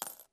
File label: gold pickup; hella farm